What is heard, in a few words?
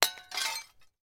impact glass shard drop